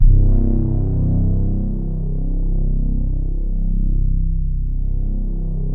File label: BRASS, SYNPAD, Synthesizers